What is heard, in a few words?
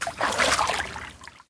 deep water step; Golden Crayon Sounds